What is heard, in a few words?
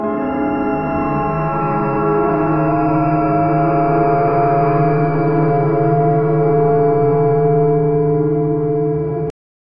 cold melancholic score